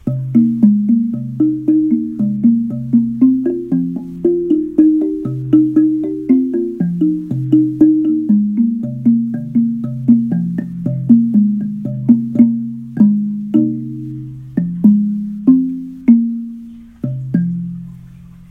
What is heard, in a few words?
Hardwood Drum